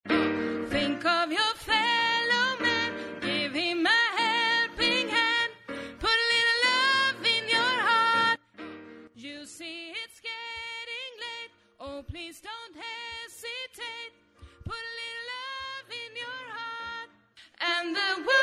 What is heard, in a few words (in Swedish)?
sopran, stammor